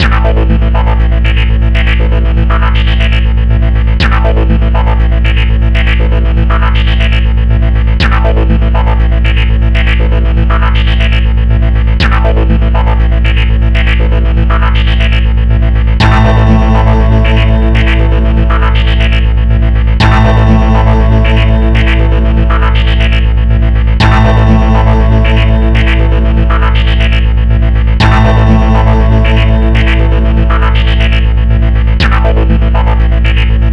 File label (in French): Musique Labo; musiques